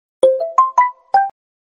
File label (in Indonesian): nada dering wa 1 detik realme; nada notifikasi WA 1 Detik Realme default